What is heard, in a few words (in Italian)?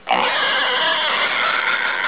maiale